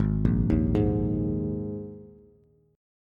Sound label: Ab Suspended 2nd chord, Bass; 4,1,1,1; arpeggiated; JGuitar